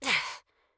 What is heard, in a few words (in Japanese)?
攻撃